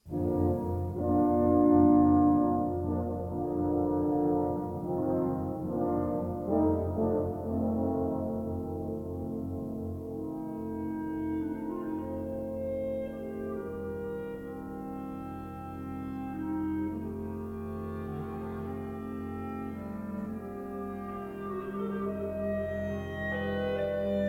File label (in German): Orchesterzwischenspiel